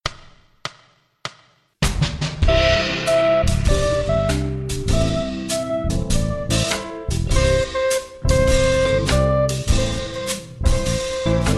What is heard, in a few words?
alto